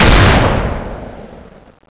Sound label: gun, weapons; zombiepanic